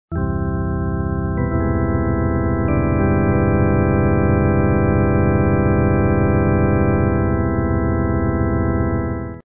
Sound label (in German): 3 Akkorde, Akkordbeispiel